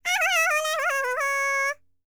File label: BALKAN, World Voices; ILIO; Vocal Planet